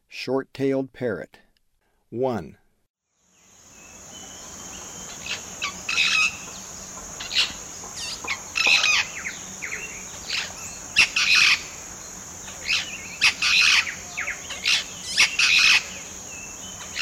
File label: Short-tailed Parrot; S. America; Eastern Lowlands